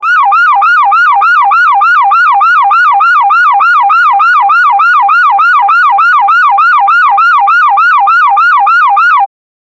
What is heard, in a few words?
soviet klaxon alarm sound, submarine